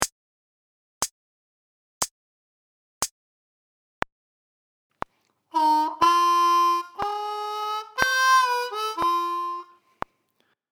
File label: Lick Slow, harmonica